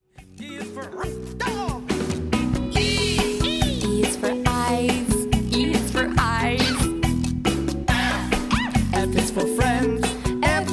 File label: ABC Song